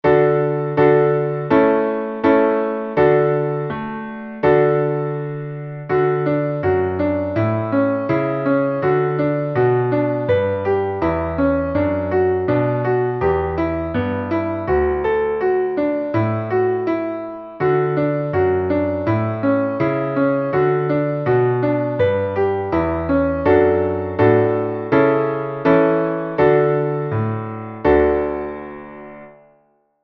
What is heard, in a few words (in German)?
Klavier; Elefantentantentag